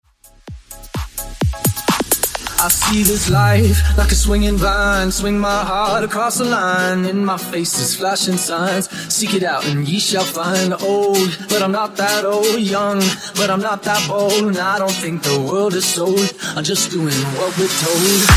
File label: Tribal Mashup